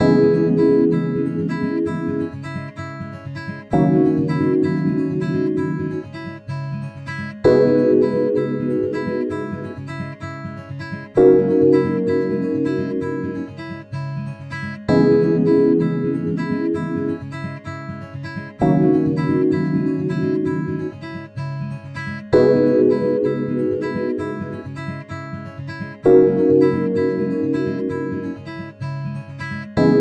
PIAINO BEAT

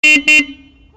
MBenz Sprinter Horn

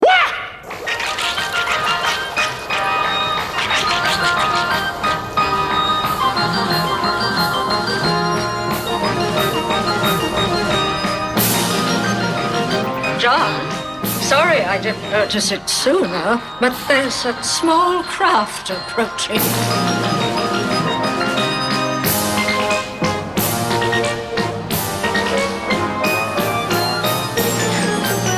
Music To Work By

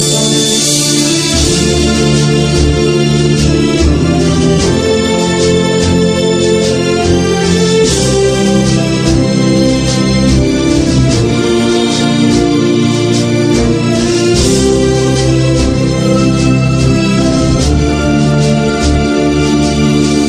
Violin Drums; Hatim

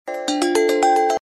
Short Bells

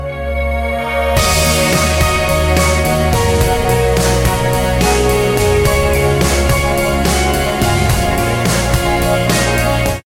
rhythm; Mirror Edge

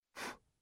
Blowing

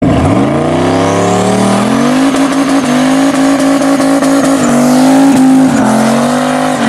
Turbo LS rev limiter

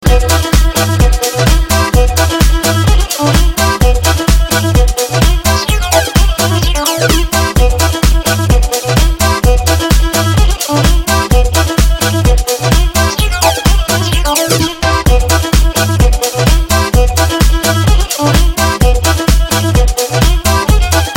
Minimal Club

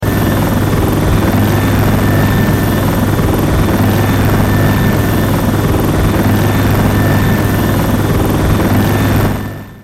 Helicopter

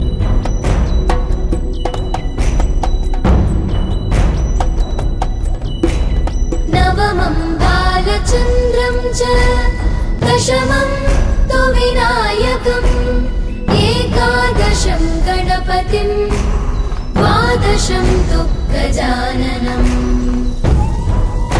Ganesha Strotam

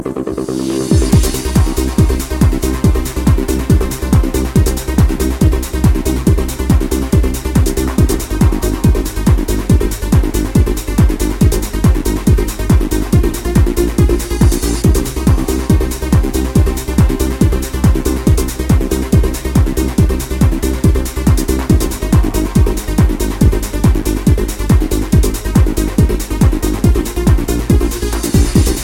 Techno Trance Tones

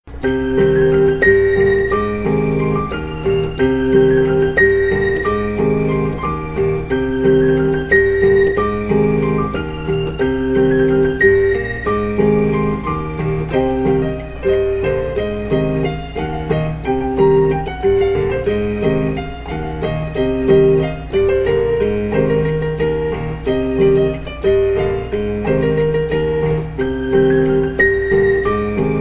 Wind Melody

